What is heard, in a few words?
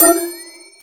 tribongo; USB Soundscan vol.33; AKAI; Disjointed Textures; DRUMATIO